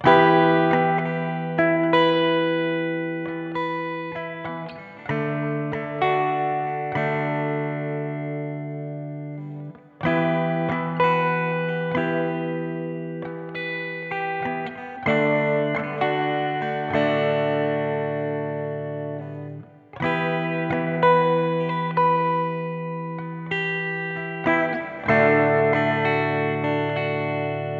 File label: guitar verse; blindmime